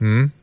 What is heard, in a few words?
hmm, human; Voice; ooh ahh